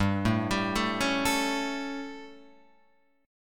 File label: G Suspended 2nd Flat 5th G Guitar Open F; Gsus2b5 arpeggiated; JGuitar